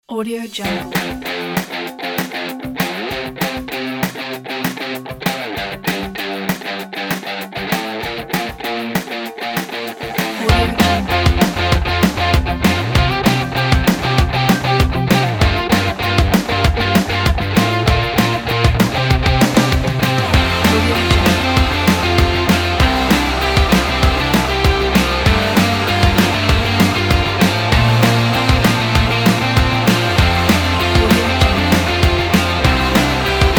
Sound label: energetic and uplifting upbeat indie rock